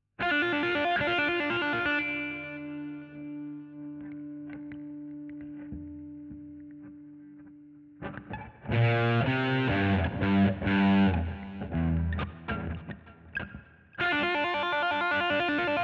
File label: GTR B Solo